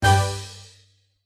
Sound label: Battle Strike